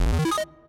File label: menu confirm; burutaru